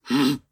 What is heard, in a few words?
shield